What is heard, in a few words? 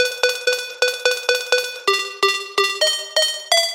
Syncopated Alarm